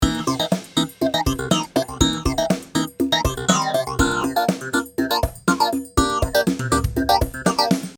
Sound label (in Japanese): クラビ